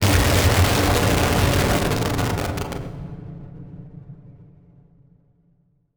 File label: Title Transition Distortion; Production Element Title Transition